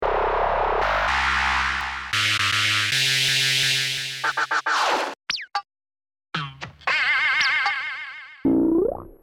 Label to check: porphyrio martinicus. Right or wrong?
Wrong